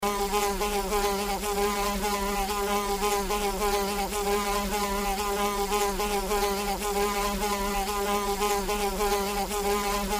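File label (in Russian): звук жужжания осы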